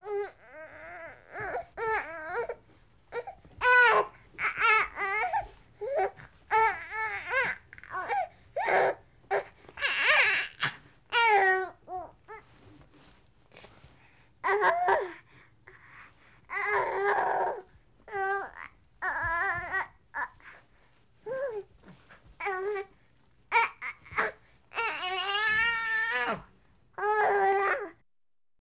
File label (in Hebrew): תינוק בוכה; פסי קול לסרטים